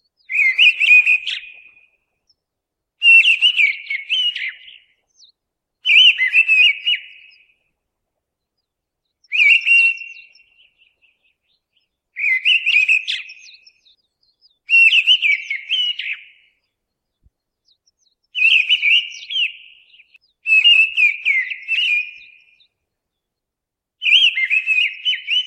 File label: mistle thrush